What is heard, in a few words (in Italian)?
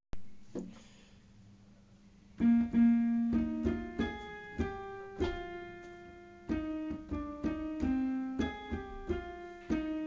Aprite le porte a Cristo contralti